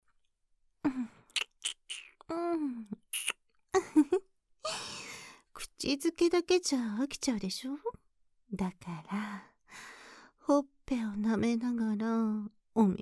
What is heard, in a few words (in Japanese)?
耳舐め, ASMR